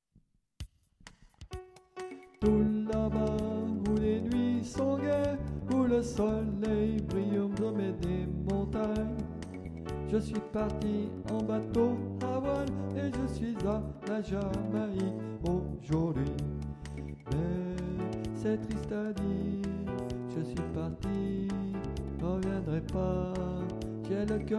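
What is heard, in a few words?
soprano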